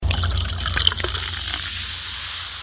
sound open

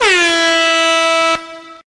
Air Horn

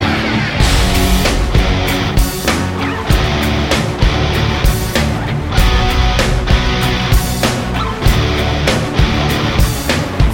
rockstar guitar, sms; ringtones

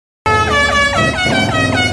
horn

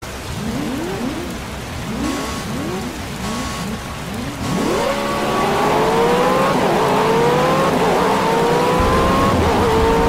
car race, ringtones

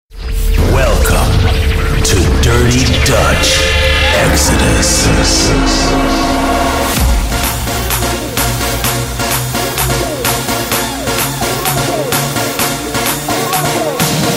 dirty dutch, ringtones electronic

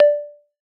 beep; GTA V

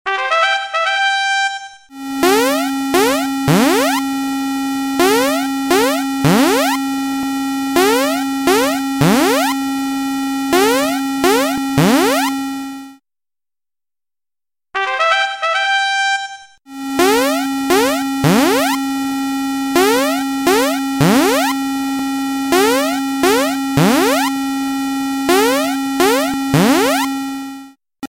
clock alert; Sony

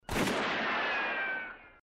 Gun

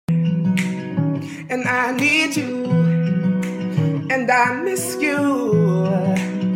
Riff

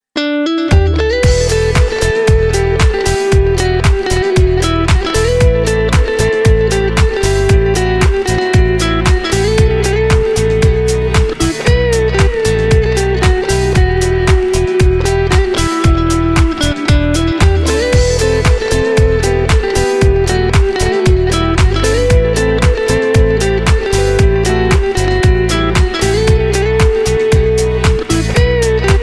guitar

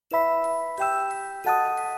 Notification; Ivy Venom

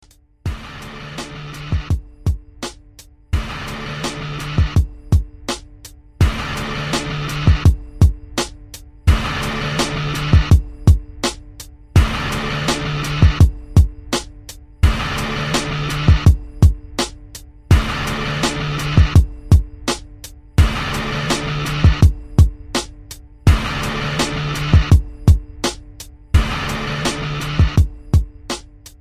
rangers hip hop

